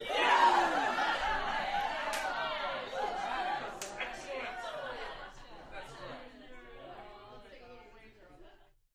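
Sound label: Crowd 15 Interior Rowdy Yells; small int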